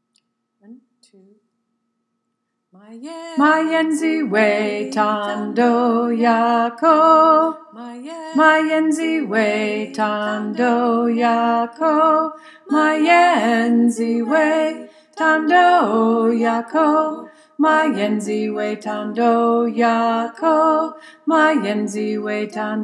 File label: bass; freedom choir